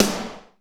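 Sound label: SNR snare, Techno Rock; Northstar; Drumscapes Roland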